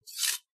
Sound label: hidden blade retract